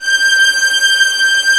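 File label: Vio legato G5; Digital Sound Factory Virtuoso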